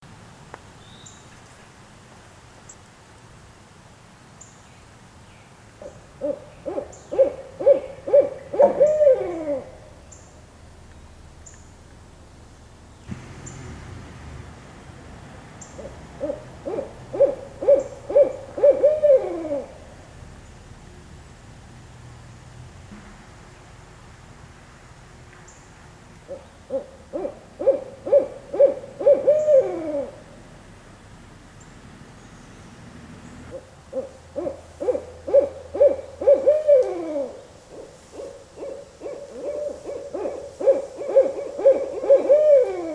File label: Barred Owl